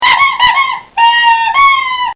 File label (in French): pouet pouet